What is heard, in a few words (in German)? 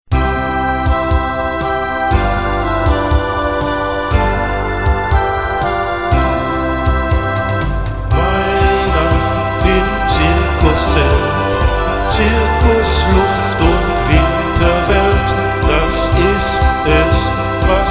zirkuslieder